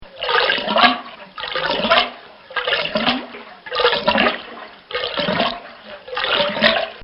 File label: Water Down The Drain; Nature; Bobby Cole